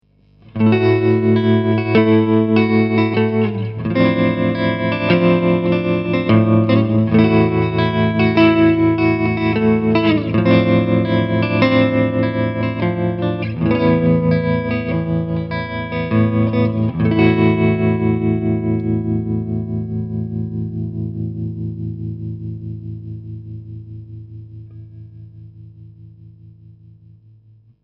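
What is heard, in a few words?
Tremarella classic, optical tremolo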